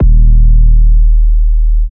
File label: 808 - D#; Drums - One Shots; 808s; Hip Hop